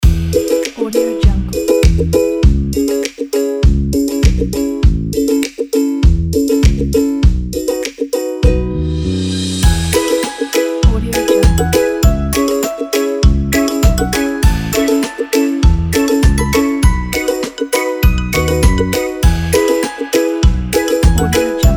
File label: happy reggae vibration